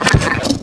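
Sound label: parasite search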